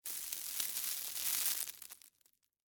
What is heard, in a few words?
Debris Ice Cream Cone, Soft; Texture; Materials; BlastWave